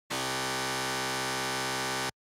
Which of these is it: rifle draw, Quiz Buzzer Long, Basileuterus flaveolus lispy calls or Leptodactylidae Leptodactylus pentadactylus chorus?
Quiz Buzzer Long